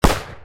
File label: Deagle